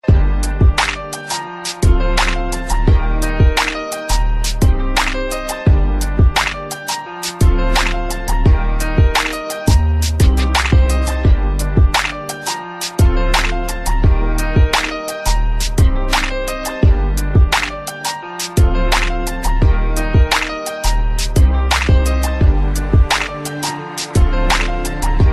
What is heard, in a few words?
cool music